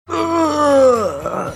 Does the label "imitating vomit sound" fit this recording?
Yes